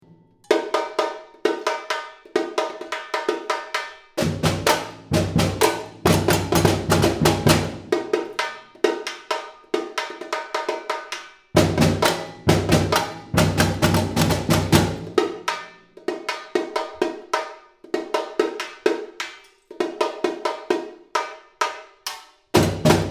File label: samba call and response